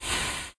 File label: fire end